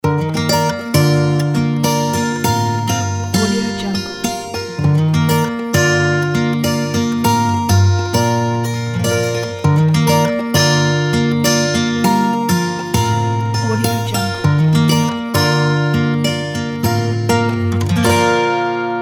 elegant acoustic podcast background

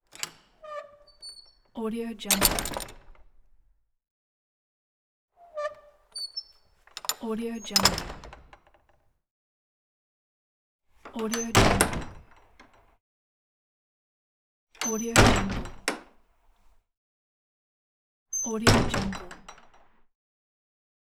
church door closing pack

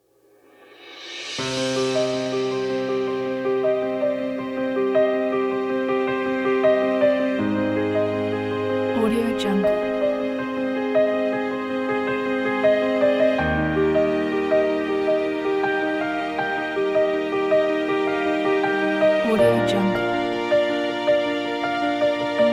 epic inspiring emotional cinematic

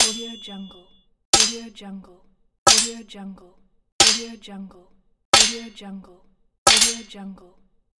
heavy sword hit

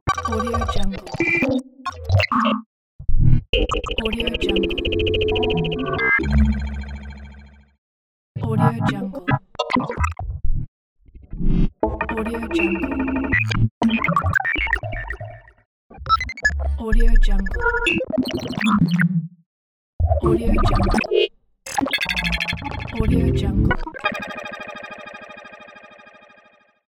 hitech device

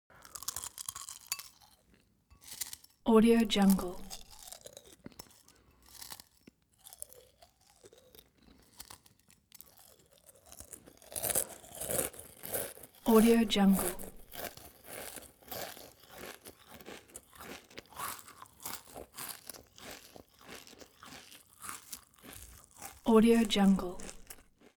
human mouth male eat cereals full; smartsoundfx

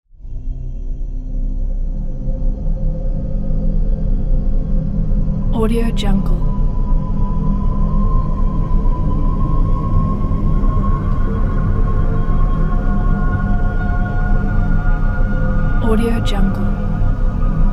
deep space ambient

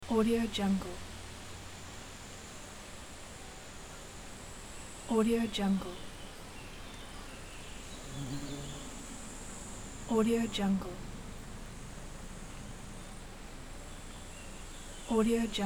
forest daytime birds insects

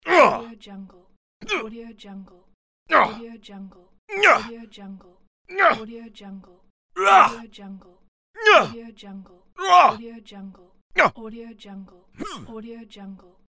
hurt grunt

